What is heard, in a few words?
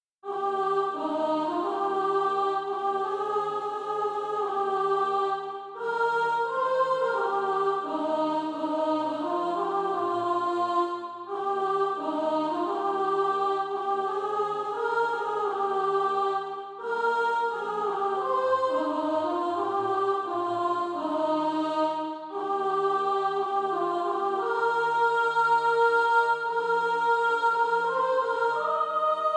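soprano, Practise Music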